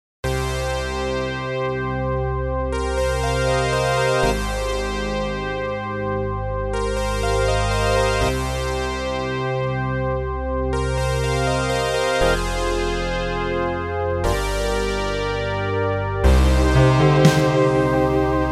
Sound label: Synthy 80